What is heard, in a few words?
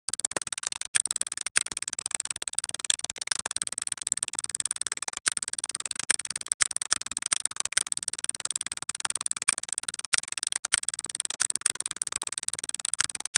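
binary sound for text typing, interface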